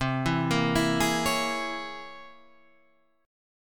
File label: C 7th Sharp 5th Flat 9th C